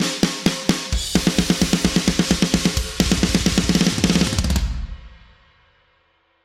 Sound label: Addictive Drums 2